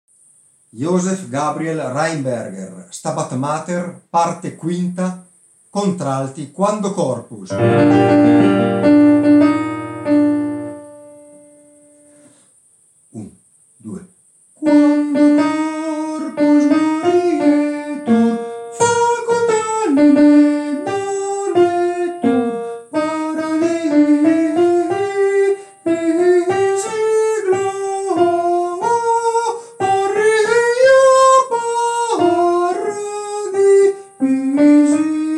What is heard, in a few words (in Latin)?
Stabat mater Contralti